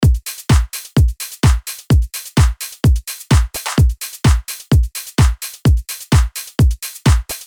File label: Big Room Progressive House 128BPM